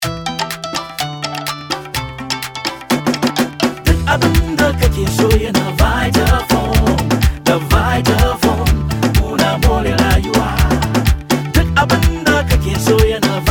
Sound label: Theme Jingle Hausa; Vitafoam 2014